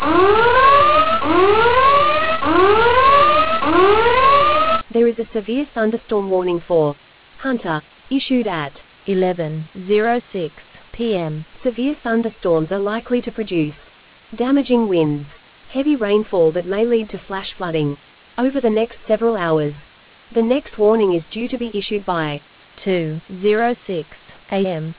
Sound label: storm audio